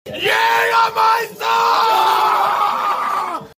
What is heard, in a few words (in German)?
schrei; jagermeister